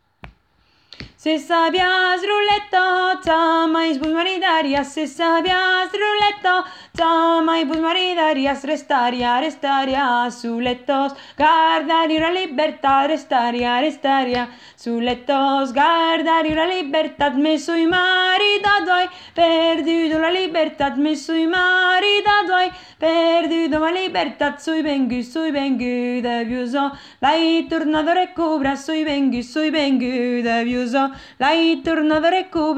Suite bourrées atelier; Ateliers chant

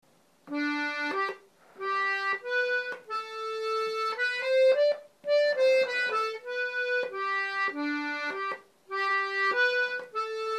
Bourree; rencontres-2011

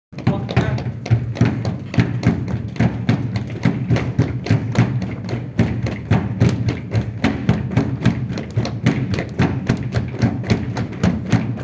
Rythme pieds; Belaye; Musique d-ensemble

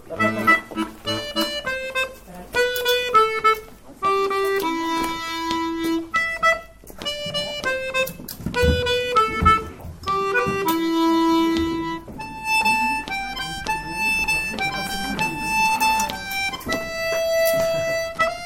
pour les violons

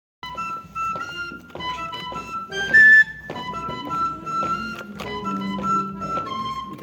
flutes; La Destimborlada

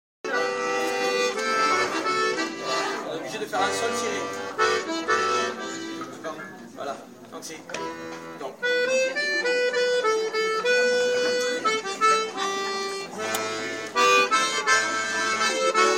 double de notes voix 1 et 2 diatos; Ederlezi

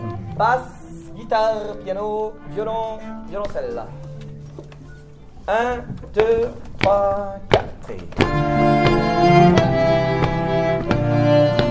Maladire - 2 themes - Basse guitare piano cordes; Musique d-ensemble

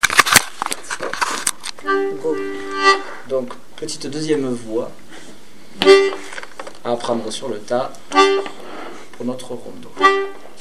Rondeau Voix 2; Quand son paire l-a maridada

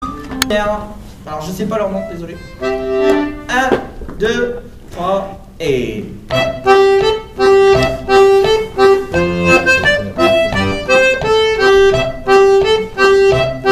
polka lent; Finish set

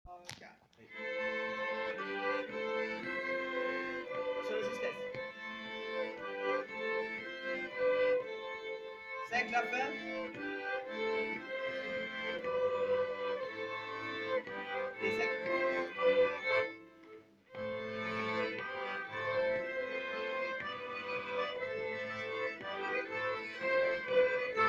Voix 1 et 2 violon ensemble; Cercle circassien; Megastive; Musique d-ensemble

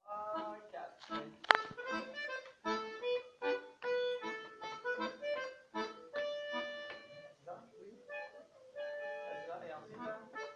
voix 2 flutes; Musique d-ensemble

